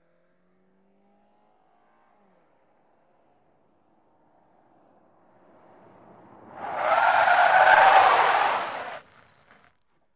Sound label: Sm Car Accl Skid, compact